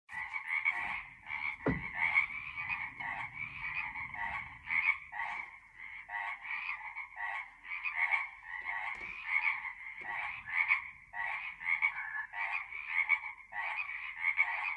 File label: tree frogs; applegate